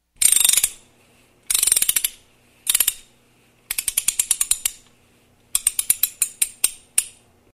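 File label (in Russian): zvuk zavoda mehanizma shkatulki, music box mechanism winding sound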